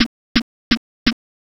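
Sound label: footsteps march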